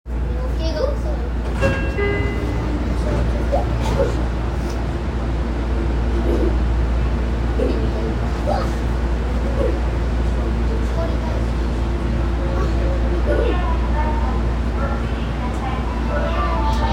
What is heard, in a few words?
train sounds; 東急東横線田園調布北